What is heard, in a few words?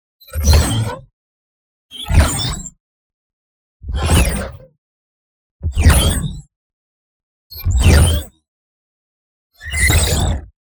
NEON ZAP Short Fuse Burst; boom library